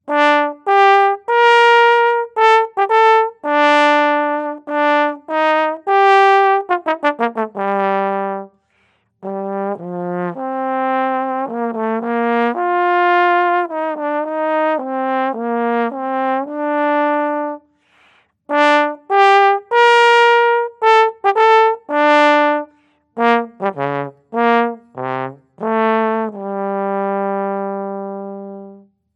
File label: Yamaha YSL 356 GE Trombone